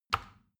plastic button tiny push regular

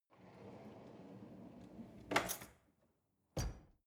drawer cutlery close draw soft

